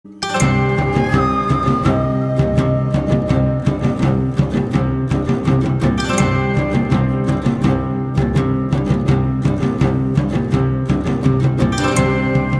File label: flute sound; Mandalorian